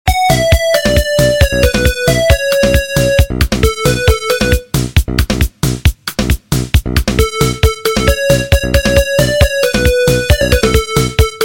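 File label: instrumental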